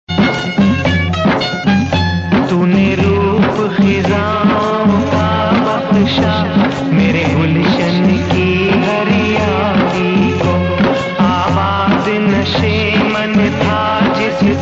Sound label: Old Hindi Ringtone